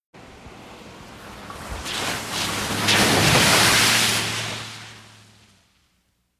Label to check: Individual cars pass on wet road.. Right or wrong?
Right